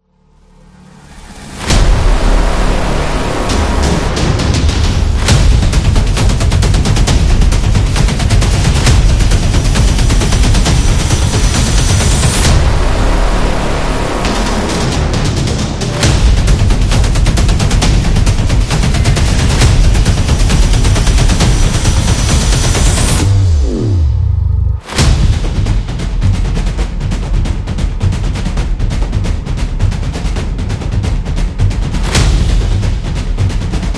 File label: Percussion Trailer; tribe